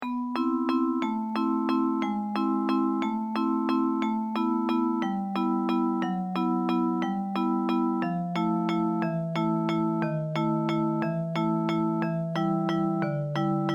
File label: elegant waltz